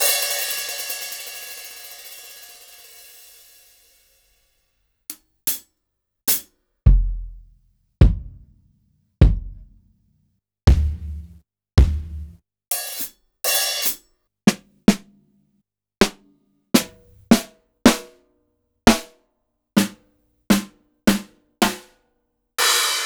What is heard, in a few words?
Acoustic, Kit; Sliced